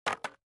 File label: puzzle piece; dyslexia; karma and prava